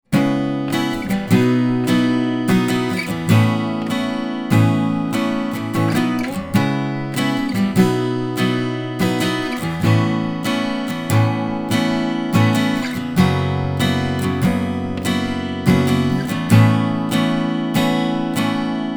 Ibanez AAM700CE NT strumming miced

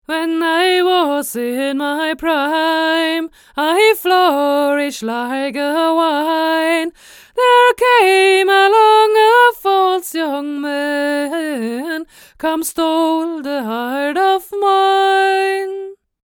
Mojave MA301fet H1 near

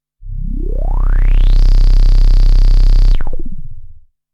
Behringer K2 Filter Sweep

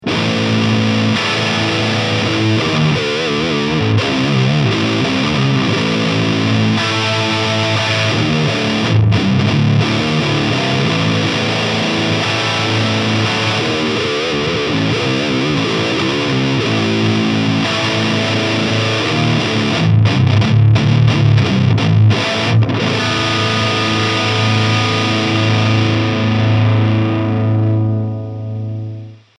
Solar Guitars V2.6FBB Baritone High Gain

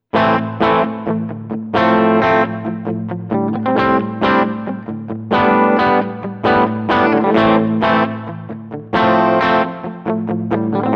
Ibanez RG631ALF Bridge Pickup Clean

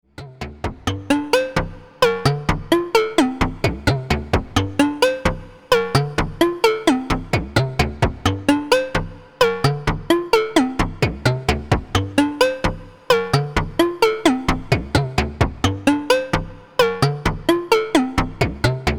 Motor Synth MkII Clutch; Gamechanger Audio

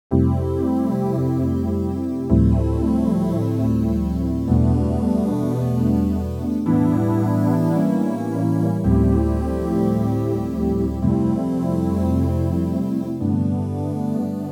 Ambi Pad; Dexed

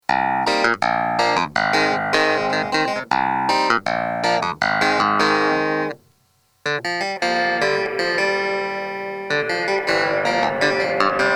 Behringer 646 Clavinet dry wet

